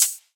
raw hat one shot B key, hat sound sample tuned to the B note. Loudest frequency: 8528Hz; hat samples